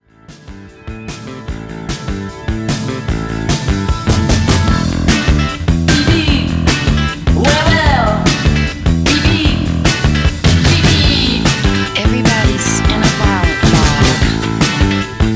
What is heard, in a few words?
chomp beep